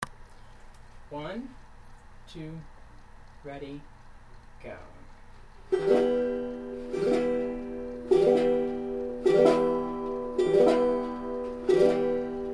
drunken rhythm

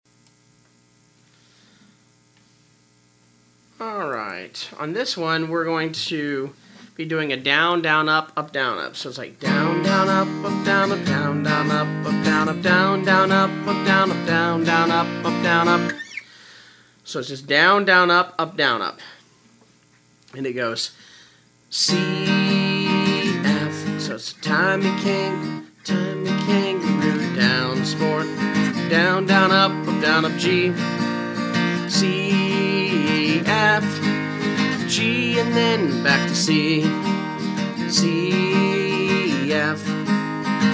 strums

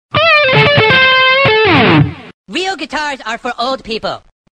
Roaring guitar old

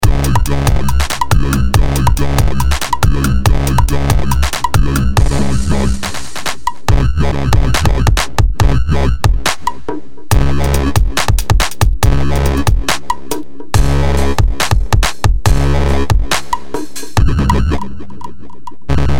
dub

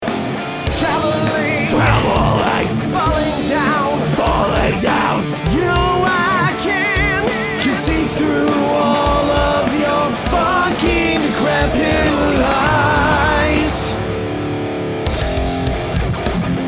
added bass drop

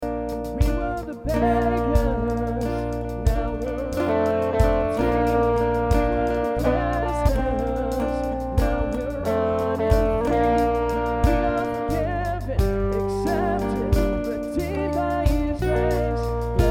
guitars

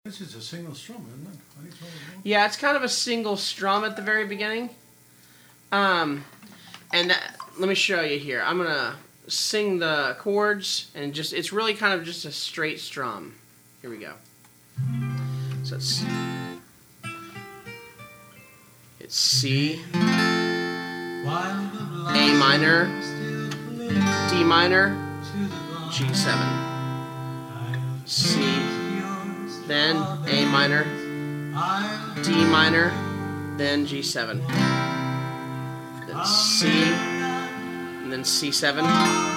strums

